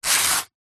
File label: industrial sand paper single rub against sand paper